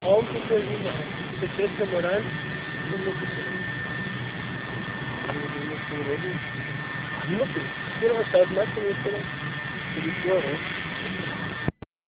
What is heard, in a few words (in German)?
heli